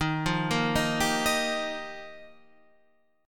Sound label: F 7th Suspended 2nd Suspended 4th